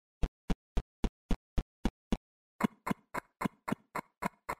zvuk shagov; roblox

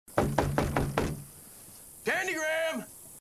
stuk v dver; Telegram

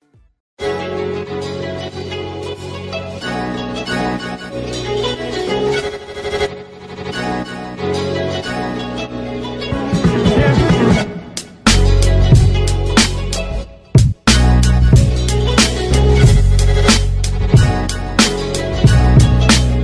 populyarnaya vlogovaya muzyka

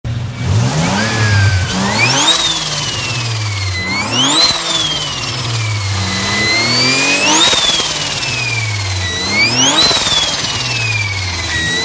turbina avto

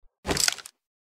perezariad arbal

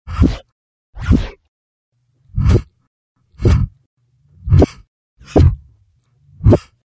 zvuk vzmahov

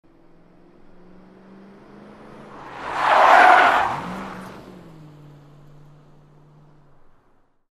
Zvuk zanosa avto vizg shyn

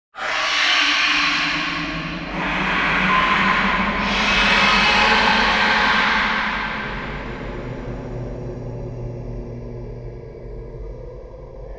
strashnye zvuki

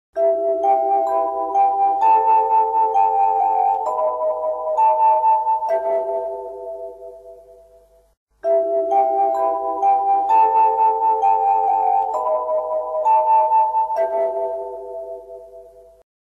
radio maiak pozyvnye

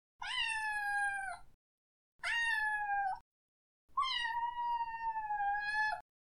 miau miau, kotyat

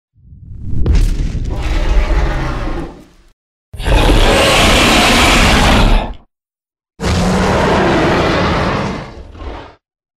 zvuk drakona

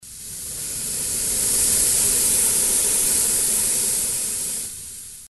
zvuk para

